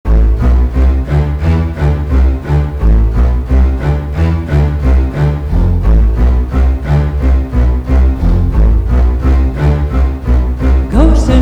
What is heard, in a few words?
Scary Halloween Night